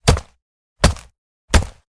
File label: horse walk